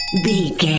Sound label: chime bell